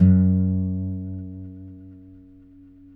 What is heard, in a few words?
Finger F#2 L, Guitars; Spectrasonics; Hans Zimmer Guitars VOL-1